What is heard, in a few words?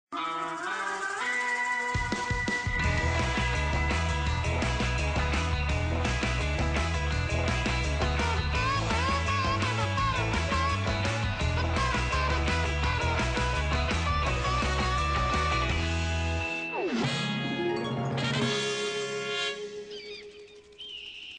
opening music; oscars oasis